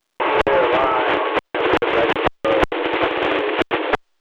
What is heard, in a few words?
CSX Static